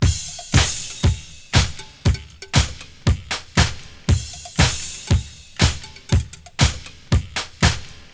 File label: 118 bpm drum beat f key